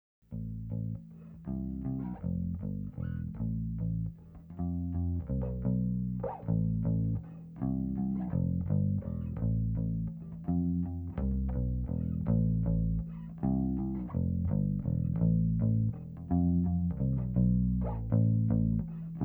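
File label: DI Line mono Bass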